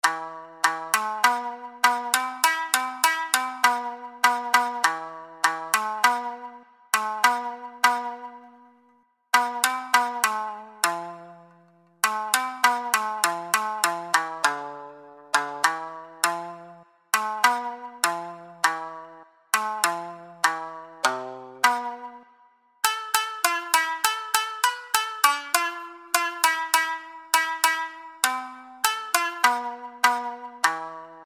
Traditional Japanese Folk, Shamisen; ShamiKoto; Syntheway

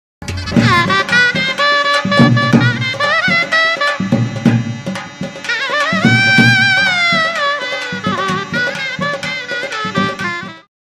Shehnai

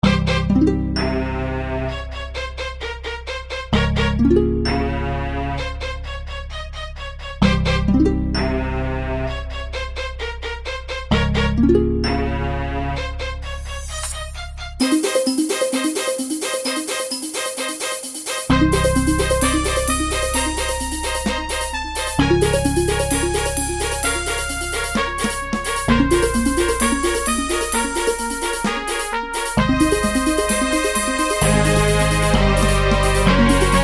Strings, Virtual Harp, Brass, Glockenspiel; Syntheway

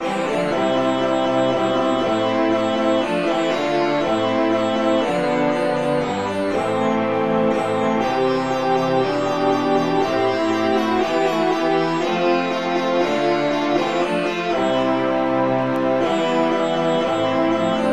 Anthem India Virtual Sitar; Syntheway

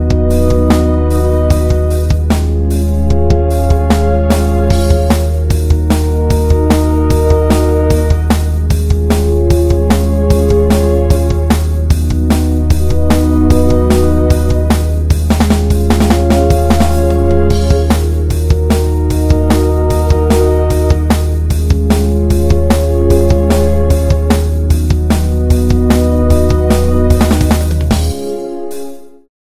PAD Horologium Oscillatorium; SpheratorFM

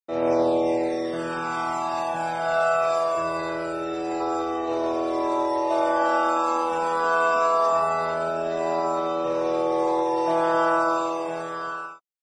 Tanpura Drone E Binaural; Sitargen; syntheway

